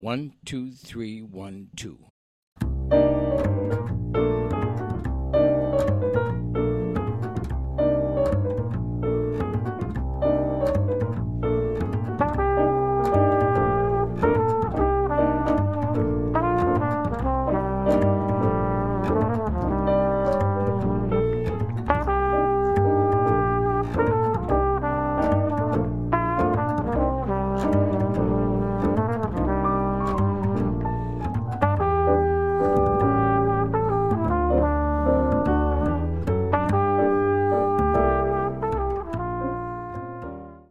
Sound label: minus drums